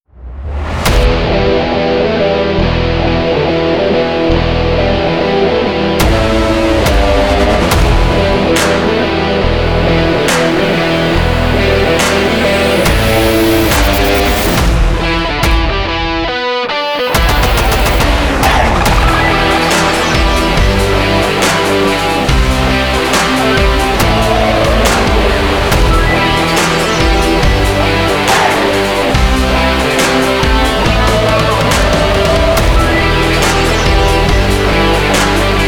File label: Epic Sport Rock Trailer; energy action videos with cars, sports, workout; This epic rock trailer is perfect for energy action videos with cars, sports, workout and etc.